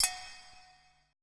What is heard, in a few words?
E HITUBE, ETH PERC